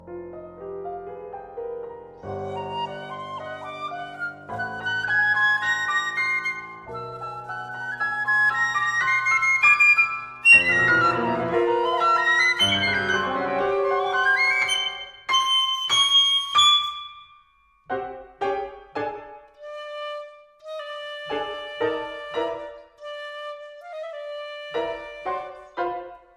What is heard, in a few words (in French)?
Sonate pour piccolo et piano